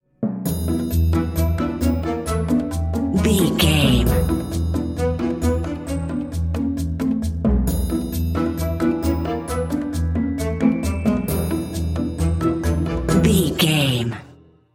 toys are alive bumper

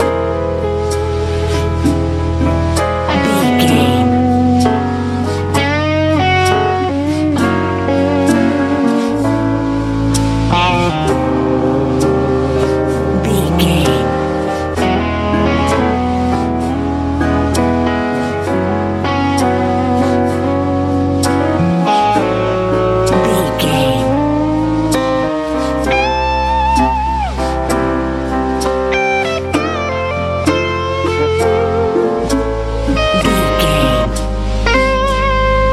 good sunny easy listening

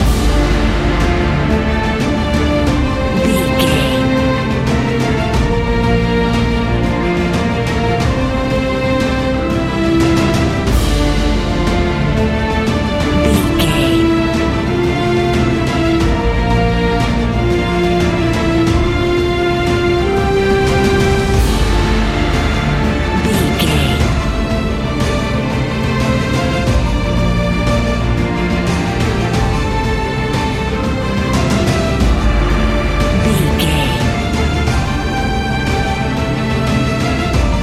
epic cinematic loop

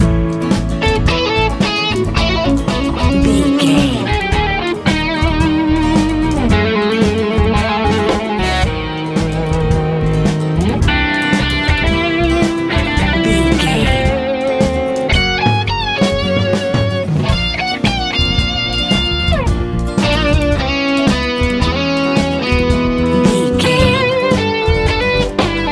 easy pop

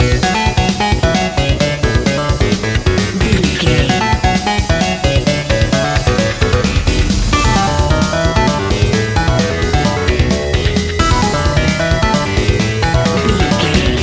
extreme racing video game level

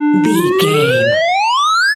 major powerup wet

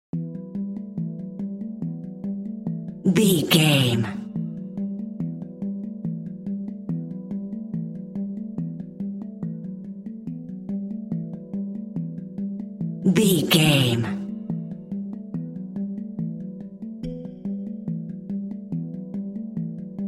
metal drum

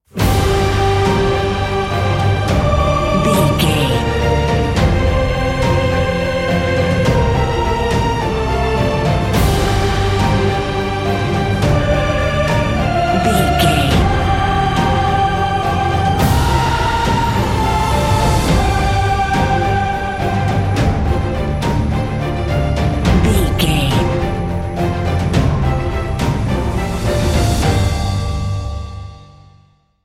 epic cinematic

